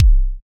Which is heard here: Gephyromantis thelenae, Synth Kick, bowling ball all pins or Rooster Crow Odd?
Synth Kick